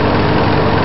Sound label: engineering vehicle, rumble; golgotha